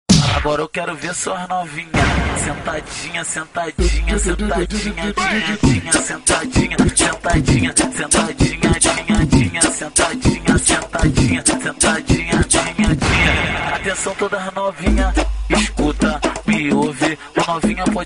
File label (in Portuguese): funk batidas